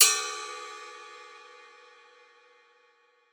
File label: Ride; One-Shots; Drums; Cymbals; Ghosthack; Color Bass Essentials